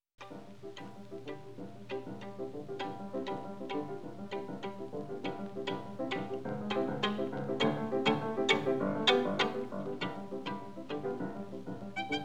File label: Rumba; Jascha Heifetz; The Decca Masters, Volume 2